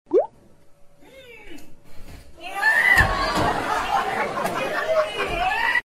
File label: man spits fire; audio meme